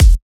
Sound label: Kick, Kicks; FLPRO